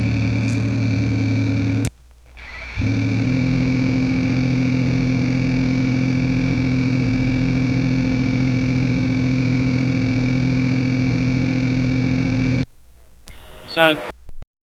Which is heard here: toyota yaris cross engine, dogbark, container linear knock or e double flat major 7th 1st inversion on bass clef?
toyota yaris cross engine